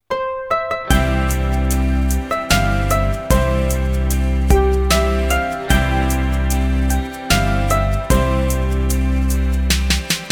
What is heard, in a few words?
Harmonica; blues harmonica